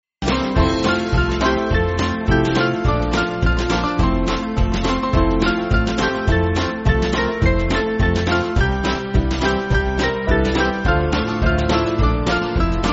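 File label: Band, Backing Files for Churches; Our Lord Is Now; McGranahan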